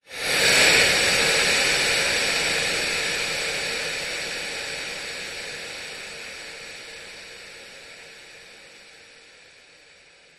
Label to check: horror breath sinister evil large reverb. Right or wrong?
Right